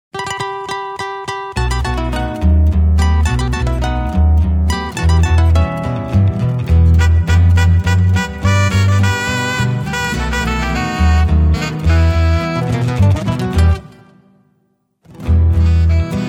pista Em

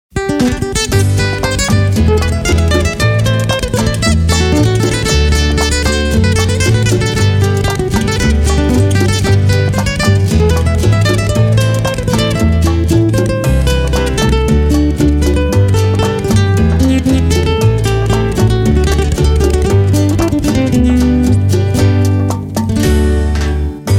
pista, pistas boleros

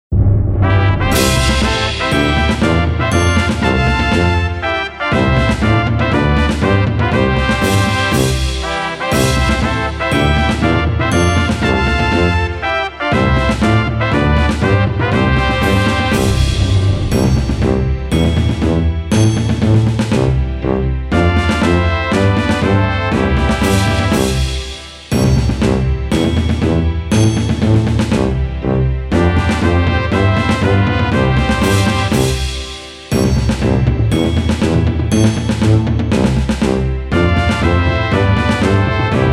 himno pista; a san rafael; medellin colombia

